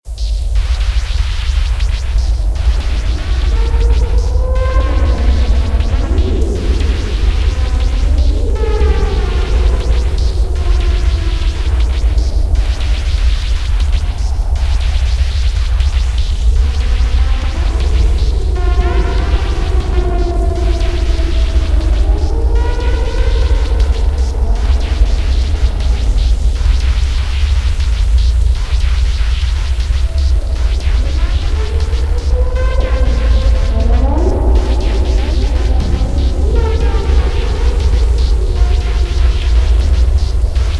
Tempo Drone 120; Best Of N2O Library; Drones & Foundations

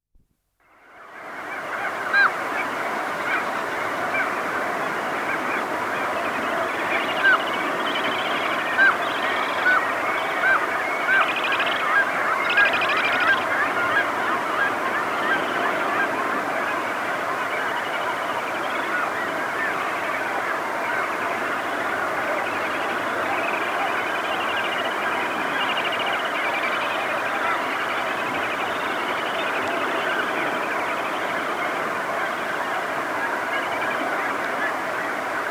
Seagulls after Nightfall; Dr. Sound Effects; 210 Nature Sounds - 20 Hours of Relaxing Natural Ambiences for Meditation and Sleep

